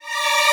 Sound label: string sfx